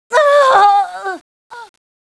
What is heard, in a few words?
die